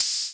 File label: Hihat Open Electrified